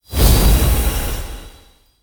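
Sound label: Pillar green attack, monster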